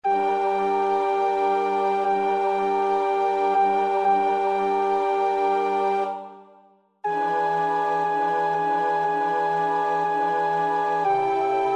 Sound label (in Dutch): alt, koorpartij oefening; Vivaldi (Antonio); Magnificat (RV 610)